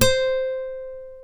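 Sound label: C4 FLAMENCO, FLAMENCO guitar; AKAI S6000 CD-ROM; WorldSounds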